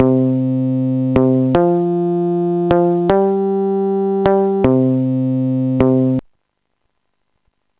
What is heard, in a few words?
bass; Sonix Music Driver